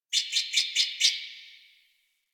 Tree Critters Happy Calm; Warframe; Duviri

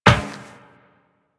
Run Metal Perforated, Footsteps; Warframe; Tenno

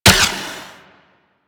Flare Launch; DE; Warframe; Weapons; Archwing; Powers

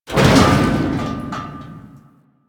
Submerged Metal Barrel; Ambience; One Offs; Warframe; Quests; The New War; Drifter Camp

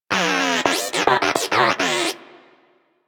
Moa Inquisitive Vocal Kata; Warframe

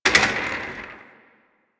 Office Chair Impact; Warframe; Ambience; Nineteen Ninety Nine

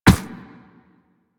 Run Ice, Footsteps; Warframe; Operator

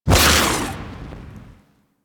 Flame Thrower Fire 3D B; Sargas Ruk Flame Thrower

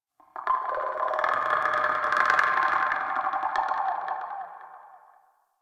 Chatter Scream; Ambience; Misc Outdoor; Warframe; Infested Eris

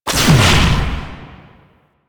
Amp Charged Shot, Weapons; Warframe; Operators; Amplifiers; Drifter

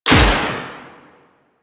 Missile Shot; DE; Warframe; Weapons; Archwing; Powers

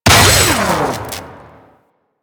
Security Camera Alarm Destroy; Ambience; Warframe; Grineer Fortress

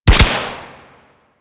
Goo Gun Shot

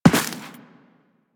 Run Dirt, Footsteps; Warframe; Teshin

